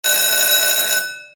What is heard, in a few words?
RING SHORT; games; American Gangster